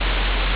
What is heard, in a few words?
ambience room tone